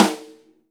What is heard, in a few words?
ST AMB SN, STEREO AMB SNARE